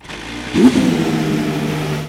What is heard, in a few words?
startup, F1; AOS F1 2022